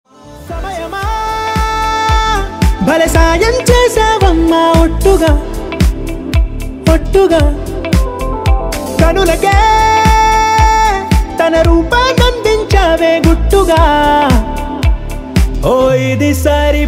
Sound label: telugu ringtone